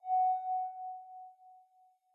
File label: air